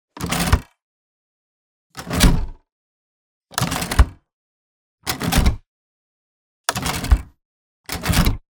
Levers Sliders; soundbits

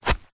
foley footstep single grass; zapsplat

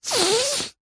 human sniff stuffy nasal close up; zapsplat

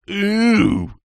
human male adult ssay eew as if disgusting; zapsplat

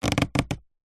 plastic creak squeak; foley

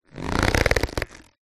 latex glove pull stretch squeak; foley

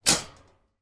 foley shopping baskets metal x2 bang together

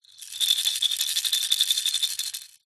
leisure toys baby rattle plastic shake; zapsplat

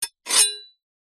kitchen knife large scrape on metal; household

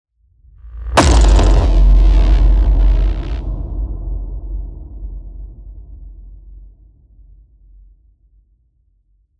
Cinematic Hit; soundbits

